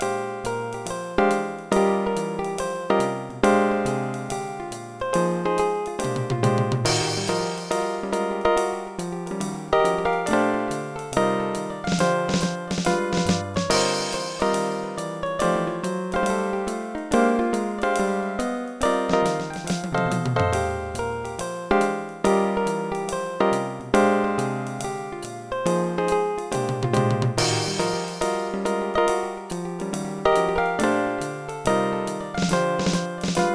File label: solo; Dr. T's MIDI Recording Studio